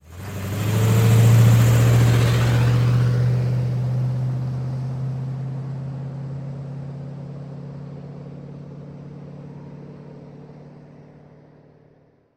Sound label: car drive away